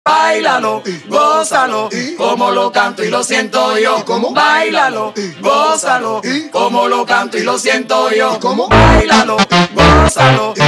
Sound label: phonk